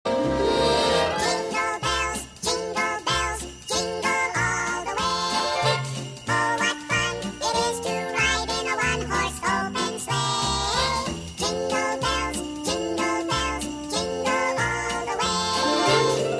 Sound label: jingle bells